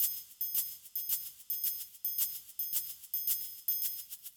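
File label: Bossanuevo, drums, downbeat electronica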